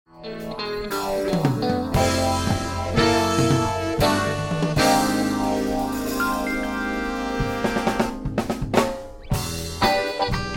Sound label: Piano Solo